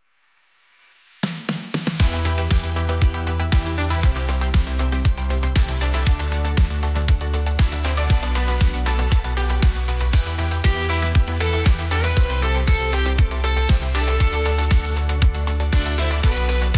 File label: electric night vibes